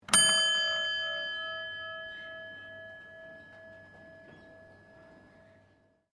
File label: Shop Bell Brass Early 20C; Various ships bells; Boats; Vehicles